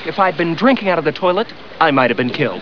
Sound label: toilet